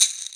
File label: Dance Drums